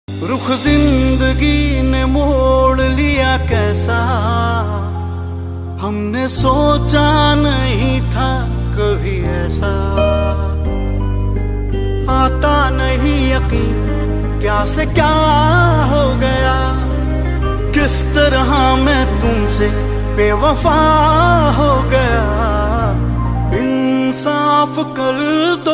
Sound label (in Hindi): ringtone new hindi ringtone